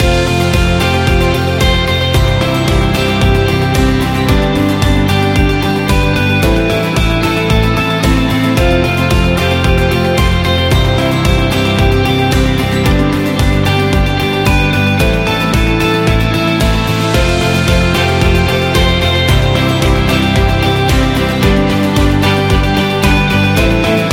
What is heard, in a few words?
Motivational Loop; technology